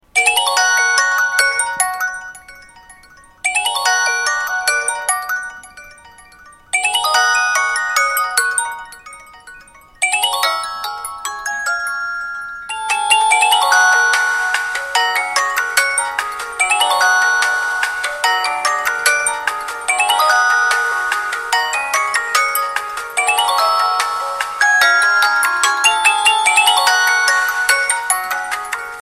call tone ringtone

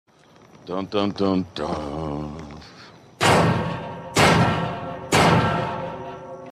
dundundundaaa notification sound ringtone

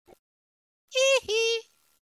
funny laugh message ringtone; sms ringtones

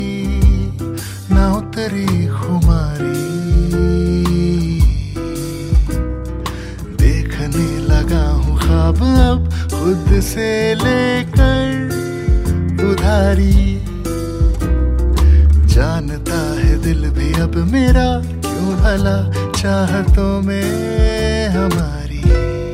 ringtone bollywood ringtones

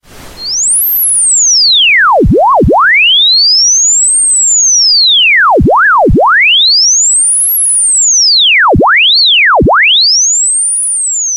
iphone radio effects ringtone

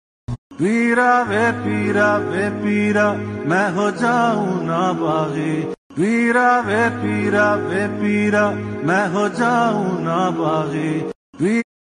song ringtone

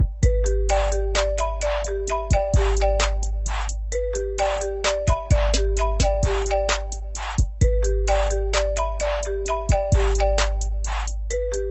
hip hop ringtone english ringtone

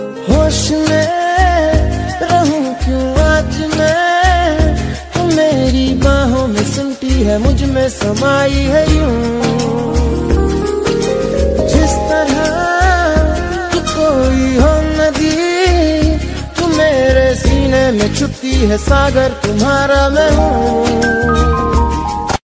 bollywood ringtones

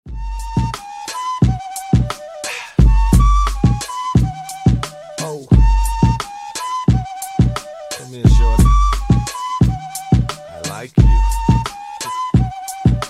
indian flute ringtone, bollywood; indian flute timbo; bollywood ringtones

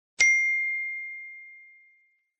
message ringtone

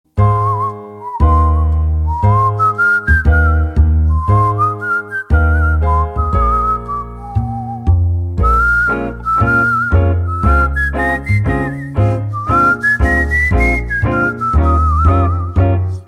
cool whistle ringtone

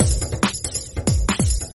break percussion ringtone

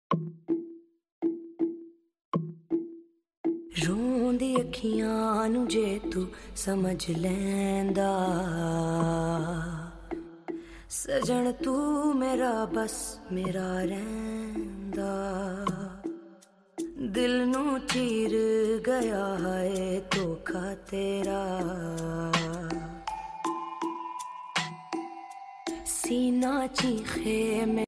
pakistani music tone ringtone

